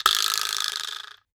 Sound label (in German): schnarre; Cartoon - film effects